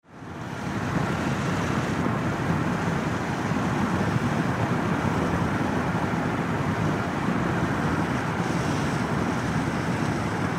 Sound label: Car Interior Drive Light Rain Window Open Vehicle; Ambience; Blastwave